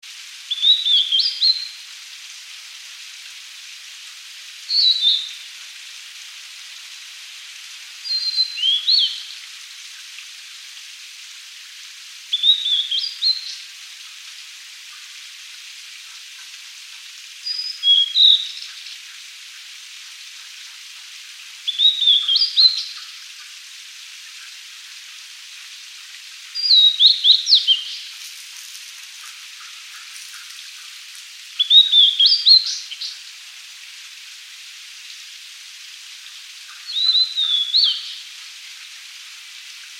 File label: Cyanoptila cyanomelana